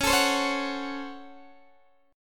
Db Major 7th Suspended 4th Sharp 5th Db Guitar Open D